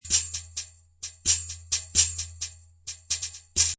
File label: Tambourine3 Loop 130Bpm, Tambourine Loop At 130Bpm; Percussion Loops